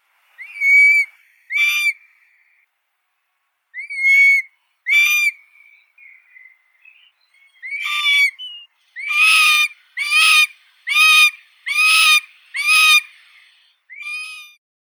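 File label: marsh harrier, Circus aeruginosus, chick; lun bolotnii; ptenets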